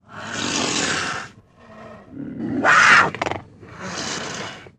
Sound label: Puma Cougar Growl Lon, big cats cougars